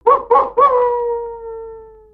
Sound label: Pet Doberman Howl, creatures; creatures pets